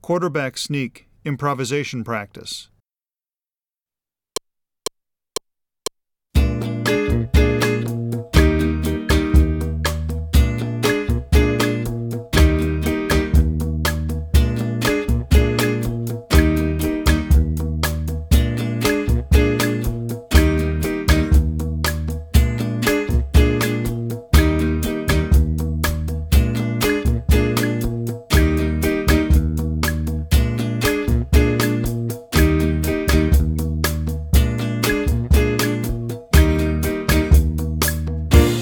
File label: improvisation practice, jazz band